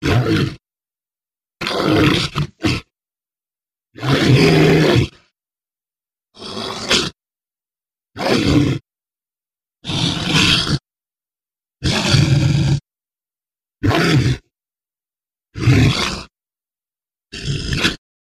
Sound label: Monster Type 2 Roars, monsters